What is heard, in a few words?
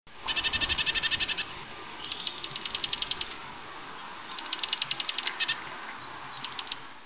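crested tit voice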